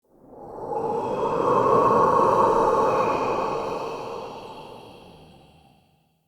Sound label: Amb Corrupted Wind Gust; Ambience; Mendicant Area